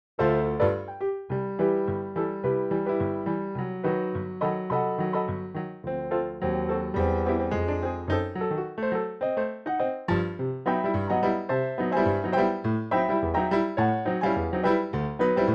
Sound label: backing